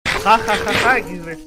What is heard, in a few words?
jajajaja